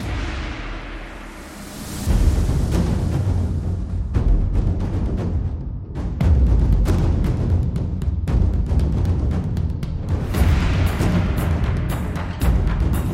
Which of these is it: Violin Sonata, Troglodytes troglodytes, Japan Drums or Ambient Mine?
Japan Drums